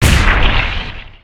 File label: BALL; fun and bullets